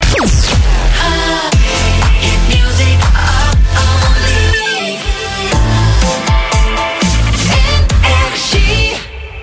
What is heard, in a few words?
Jingles; NRJ Shotgun ID (2025)